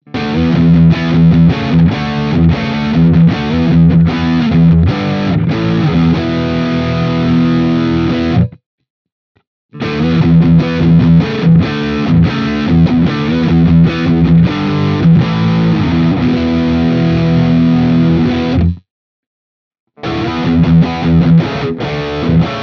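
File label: Hagström rhythm Riff A all positions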